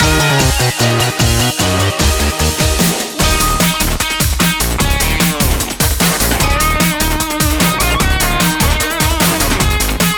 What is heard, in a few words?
All-Star Theme; Space Channel 5 Part 2; Sonic & All-Stars Racing Transformed - Original Sound Version